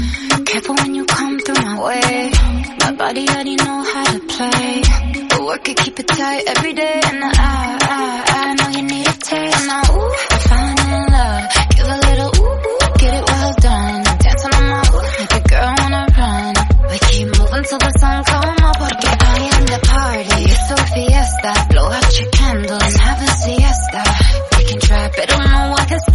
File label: Mobile Ringtone